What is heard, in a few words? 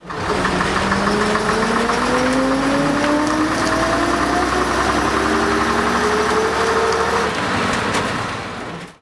aec stl diesel; richard walker; simutrans; Pak128.Britain-Ex